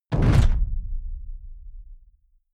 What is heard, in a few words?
sci-fi underwater shot soft